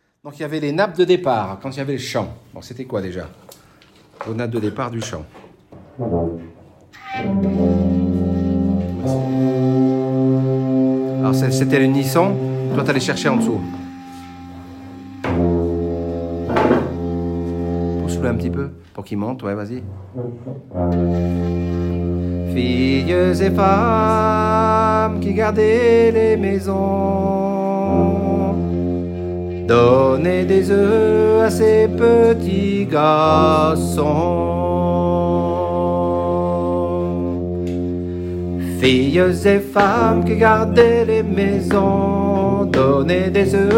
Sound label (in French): Filles et femmes chant